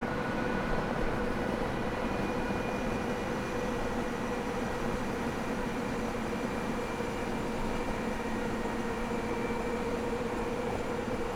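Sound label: Truck Tires on Asphalt Steady; Car Sounds; Poetry Project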